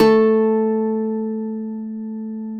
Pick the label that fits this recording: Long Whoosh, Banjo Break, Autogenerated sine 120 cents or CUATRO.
CUATRO